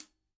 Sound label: ClHat, drum